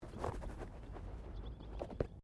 Body movement Foot Foley; Body movement foley to simulate falls and deaths; Footsteps; Human

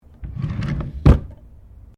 Drawer Interior Wooden Utility Small Empty Open; Various domestic drawers; Household

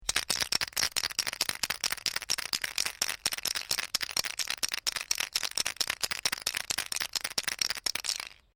AEROSOLE SPRAY CAN SHAKE LONG, Can of spray paint; Spraying; Objects; SoundEffects-Foundation1

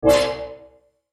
Mouseover Musical Stab; Swell Tonal Harmony; Multimedia; Blastwave

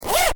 Zipper, Pull up zip, or pull down zip; unzip, zipper sound; Zipper Sound Effects; Lynne Music

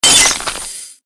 Created Magic Spell Ice Bolt Impact; Series of created spell sounds

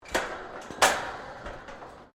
METAL IMPACT CLANK; Metal Sound Effects; Metal (Sound Effects:Materials)

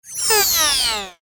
Descend Digital; Multimedia; Gapper, Power, Down, Production Element, Imaging Element, Accent, Transition; Blastwave

